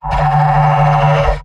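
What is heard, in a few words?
jeers, robot; pyro; mvm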